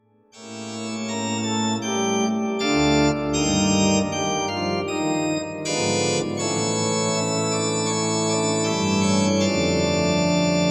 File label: Preludium; Carbonelli